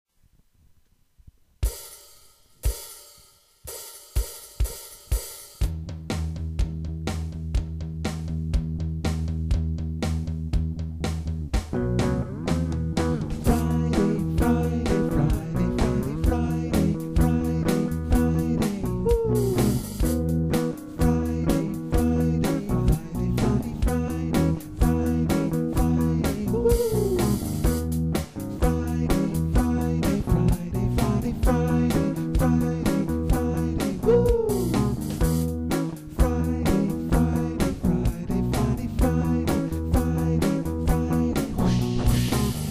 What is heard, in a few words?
song rock version